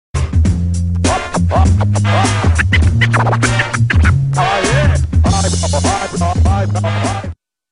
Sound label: Swift Wind